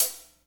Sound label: HH, KIT Real-Kit; 90 s Sample CDs; Sound & Vision - Gigapack I CD 1 (Roland); Real-Kit s11